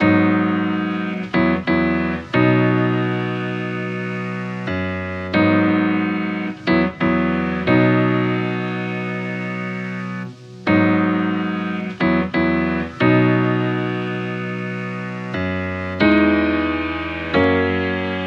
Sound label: 90 piano loop, Bmaj